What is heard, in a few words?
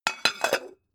pan close